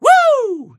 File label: woo